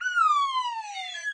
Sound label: slide whistle down